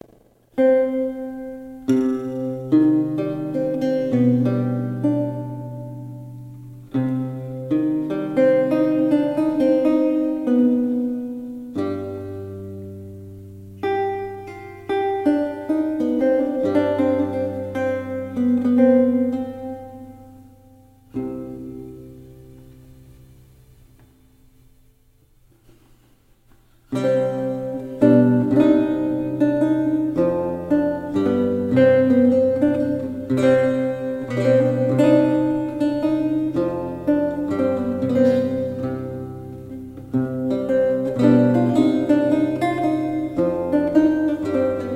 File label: prelude menuet